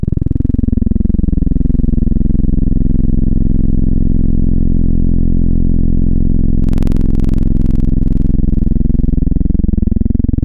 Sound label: PWM LPF; Synthetic's Serum Collection